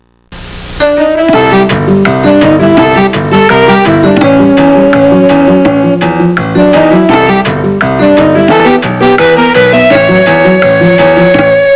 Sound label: end theme